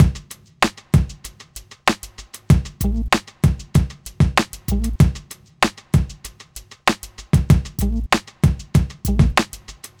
Drums, Hip Hop

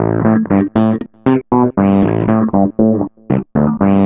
Distorted Bass, Electric Bass; Sonic Foundry (Sony Creative Software); Leo Cavallo Bass Taster

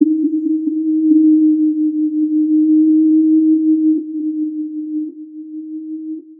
loop, techno atmos

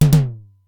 Simmons SDS-9 drum machine; Factory C5

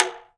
TALK DRM, Heart of Africa; Spectrasonics

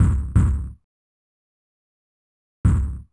Monster Kick; Zero-G Smoke

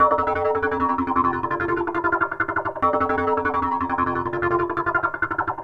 Synth Pulse In; Sonic Foundry (Sony Creative Software); Knutrix Drum & Bass

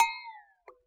glock fx; Univers Sons - Raricussions

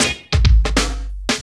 fills; Sound & Vision - Gigapack I

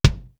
DBL HEAD, East West - Global Sounds; D4 BD SD TOM

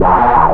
STACK26, Big Beat Samples; AKAI; STACKS KIT

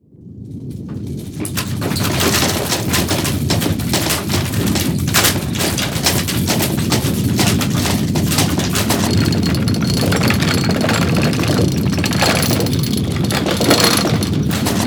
HOUSE QUAK, Creaks & Quakes; SFX

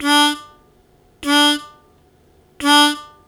Short Attack D1, Harmonica; Zero G - Harmonica

